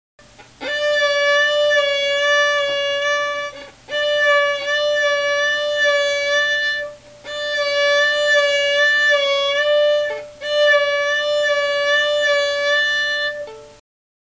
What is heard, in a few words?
vibrato, violin